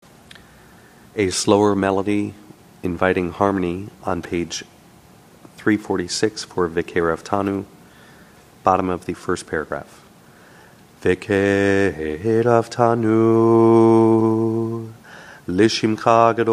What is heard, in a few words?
ahava rabba slow harmony, shabbat tunes; Shabbat Shacharit